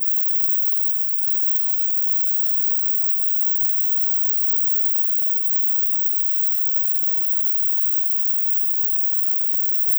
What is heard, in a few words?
the sound of a pulsar, space